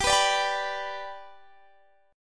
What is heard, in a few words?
G Major, Guitar, Drop D; chordshape; down